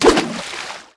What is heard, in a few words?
fishing catch; MiniGames